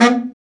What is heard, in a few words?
BRA BARI, Bariton Sax